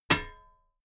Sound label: metal ladder step heavy